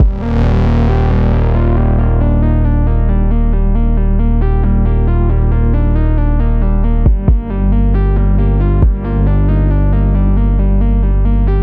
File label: City Ruins Stem; Epitasis